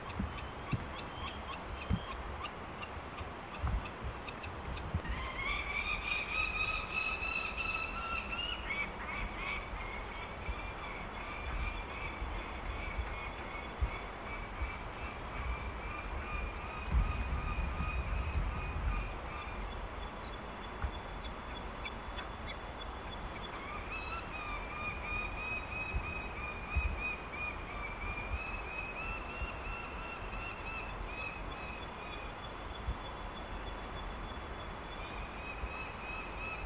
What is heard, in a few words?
Lanner-falcon, پرنده لاچين